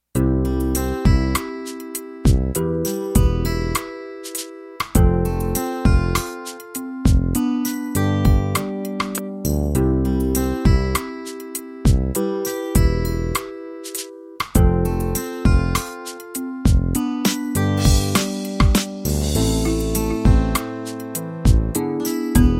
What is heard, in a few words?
16 beat ballad